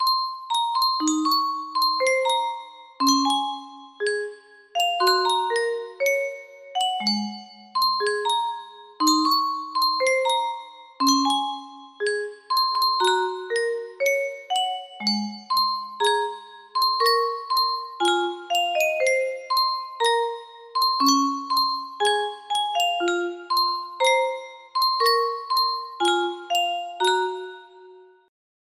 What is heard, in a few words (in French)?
yunsheng boite a musique